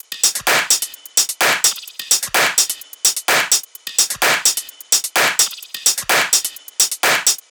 tops 128, Drum Sample Pack, Loops, Top Loops; SD SEISMIC; Slate

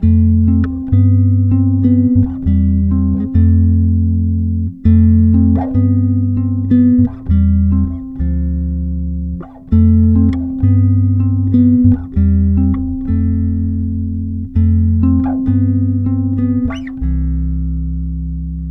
Bass loop 99 C, Electric Bass; Electric Bass Loops; Slate Digital BASSHOLE Sample Pack

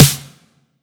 909 Snare; Slate; Vintage Drum Machines Volume 3 909 Edition

